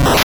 cut, attack sound; カタナ１

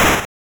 shoot, attack sound; カタナ１

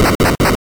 hit x3, attack sound; カタナ１

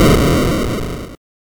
overthrow, attack sound; カタナ１

